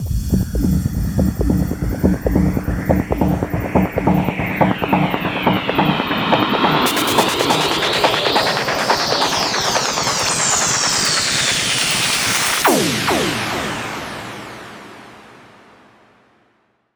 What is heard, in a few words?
140BPM Warp FX 8 Bars; Vengeance Samples; Snares&FX Pairs